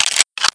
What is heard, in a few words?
m4a1 silencer on, weapons